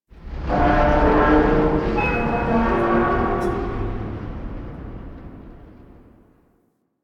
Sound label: Ambient Tank Vocal; Warframe; Nineteen Ninety Nine